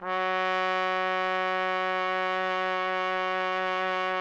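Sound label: trumpet; Roland - LA Composer vol. 3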